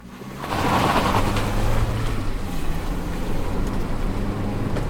Vehicles, Car, SUV, Drive Away, Internal, Wheel Spin, Gravel Track, Stones Hit Car, Skid; Stop; Blind Drive